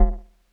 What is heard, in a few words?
Drops Kawaii E0; keys